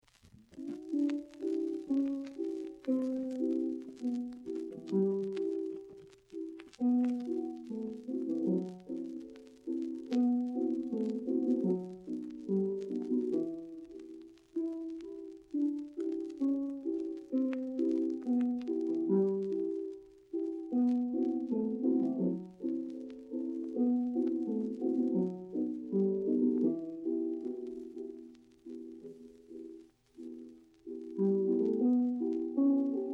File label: Melodie Piano; Massonet; Arnold; 1943 wagner family recordings